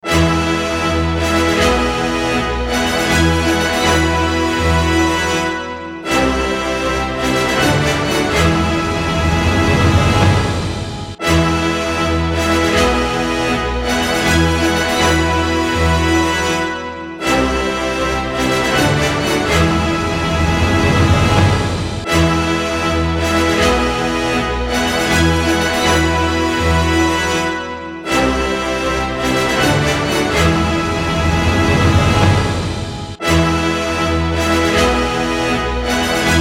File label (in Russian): Фанфары поднятие флага